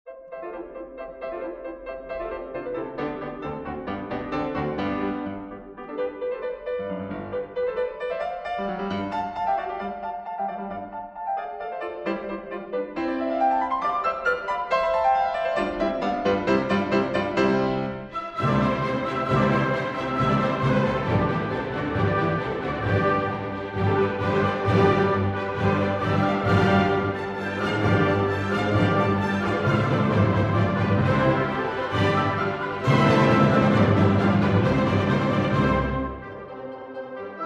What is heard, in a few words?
Piano Concerto No. 1 in C Major, Op. 15 III. Rondo - Allegro scherzando